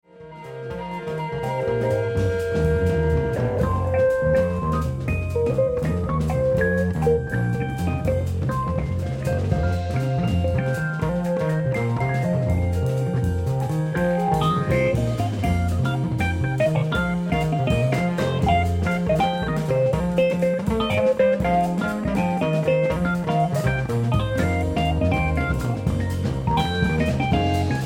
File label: keys solo